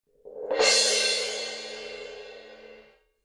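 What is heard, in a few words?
suspended cymbal roll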